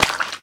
Splat

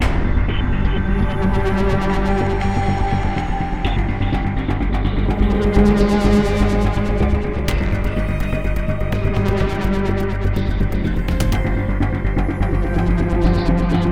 Battle Chase Ambient; New World - 4.0 Foundry Battle - Chase Ee Edit Ambient

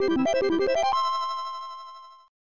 Success

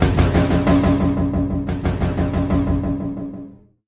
90 bpm beats

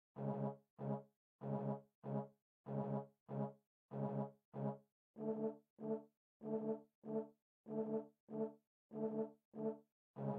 Trombones; Disaster Golf

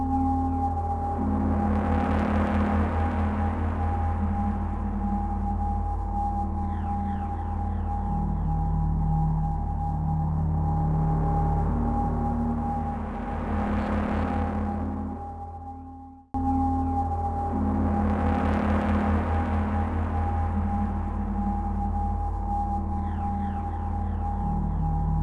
Loop fade; Heroes Over Europe

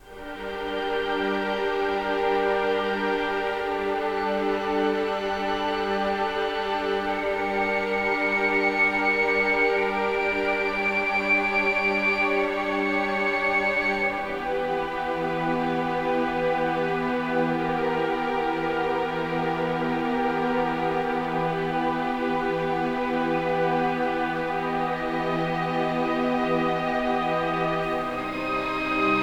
Graveyard - Loop (Strings Stem); PS4 PS5 Switch Windows Xbox One Xbox Series XS

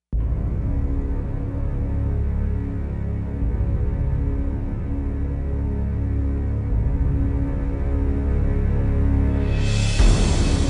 desert combat; the kings heroes

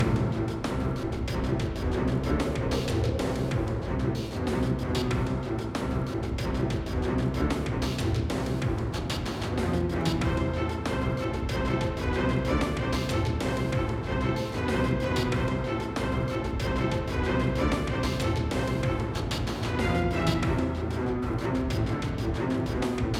Battle Loop T94 4-4; Mistover

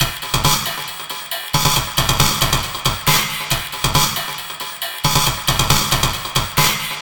Loop; The Breeding The Fog

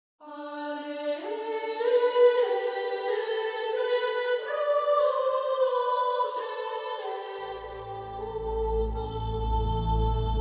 Mood Tension Light

